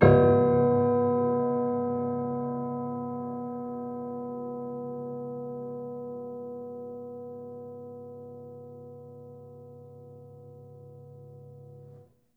Harm Ped Low, Piano Harmonics

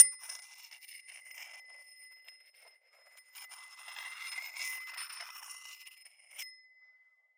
Hole Saw Scrape; Hole Saw Bits

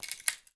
Palette Tear, Wooden Palette

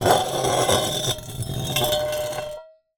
Drag, Vase

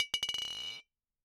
Bottle Struck

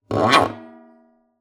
Classical Guitar Extended Technique

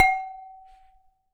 Tap Glass, Medium Wine Glass

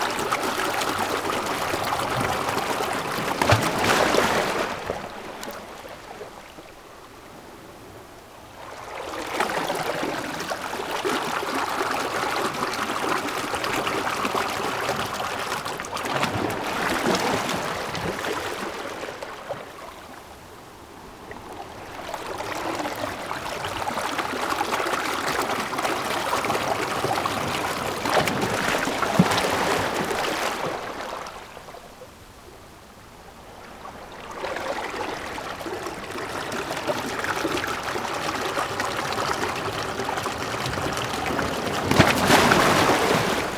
waves

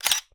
Camera Mirror

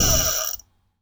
Spin Down, DVD Drive Internal; DVD Drives

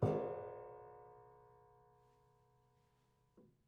Prepared Piano

